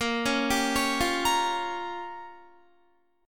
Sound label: A sharp Minor 6th A sharp down Guitar, chord; A#m6; arpeggiated